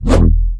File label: FIRE GOBLIN attack, game; Eft